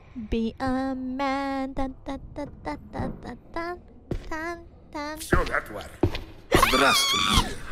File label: Singing; IRySinging